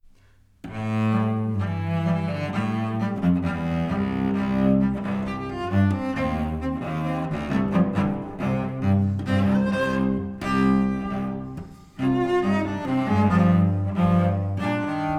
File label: cello duo